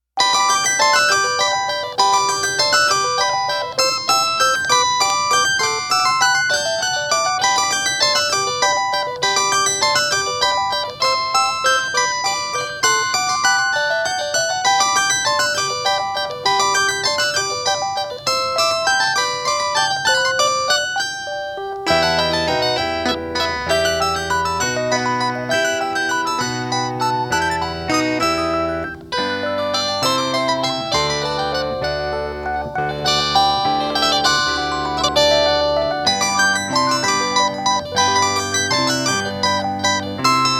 Music Box Dance (Minuet in G Major); BWV Anh. 116

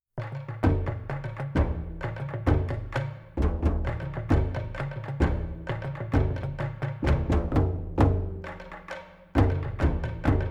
Distant Drumming Continues; Return to the Blue Lagoon

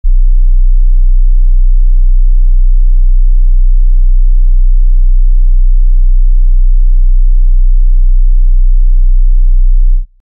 31 Hz

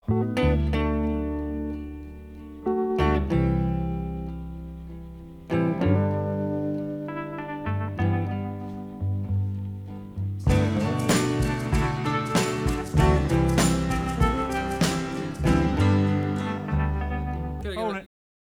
rehearsal

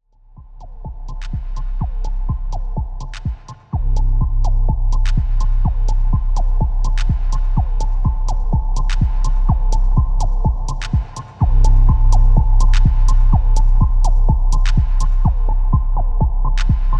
Focus Soundscape; Endel

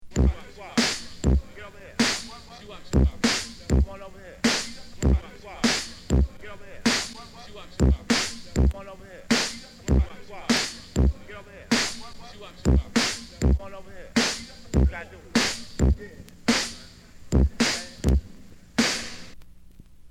Beatbox; The Premo Collection - Breaks

